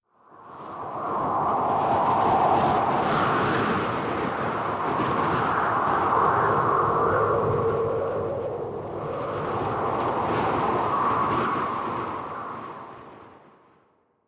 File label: Wind measure